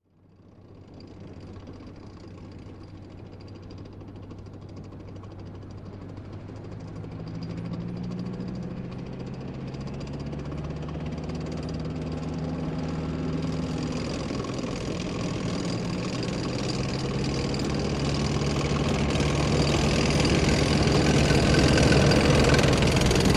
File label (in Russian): tank proezzhaet zvuki gusenic